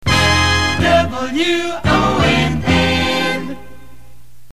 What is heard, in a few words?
jingle; WONN